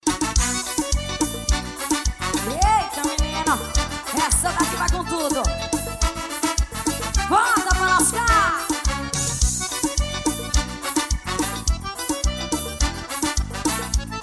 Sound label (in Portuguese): forro